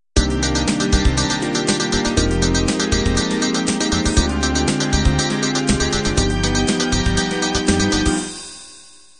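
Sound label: standard 16 beat intro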